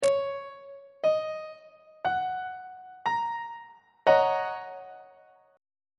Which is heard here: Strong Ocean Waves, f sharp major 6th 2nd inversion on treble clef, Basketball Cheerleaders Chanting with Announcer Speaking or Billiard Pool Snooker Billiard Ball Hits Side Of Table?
f sharp major 6th 2nd inversion on treble clef